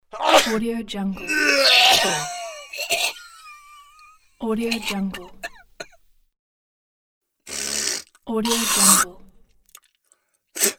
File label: human body noises pack